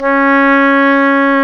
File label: oboe; Roland LCDP04 Orchestral Winds; Oboe Brth 1